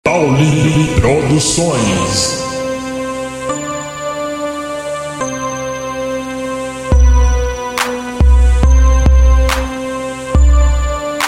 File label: base rap